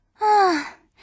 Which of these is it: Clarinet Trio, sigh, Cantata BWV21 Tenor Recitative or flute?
sigh